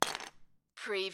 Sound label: Lego, single lego piece drop onto pile of pieces